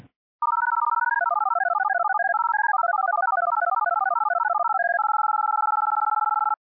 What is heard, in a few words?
DTMF Bomb